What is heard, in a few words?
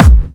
BD Clubby; VEC1